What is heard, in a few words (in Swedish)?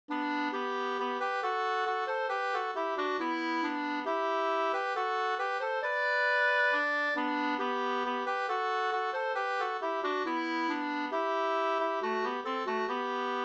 ganglat G dur